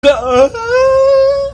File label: squad yell